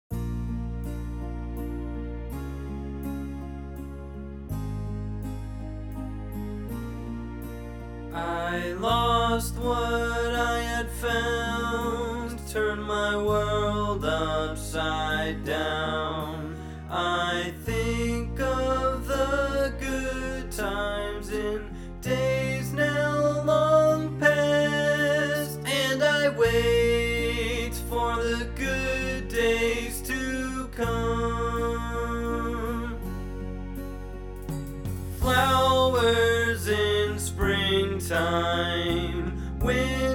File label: vocal